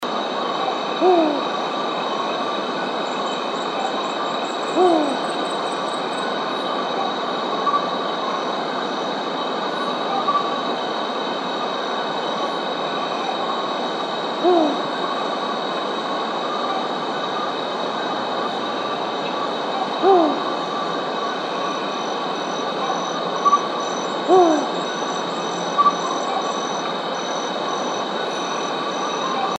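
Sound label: emerald dove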